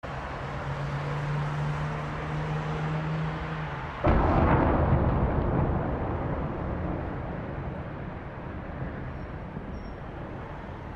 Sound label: explosion; syria hq